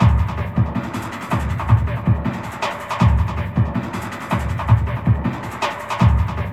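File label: jungle filter; Zero G; Malice In Wonderland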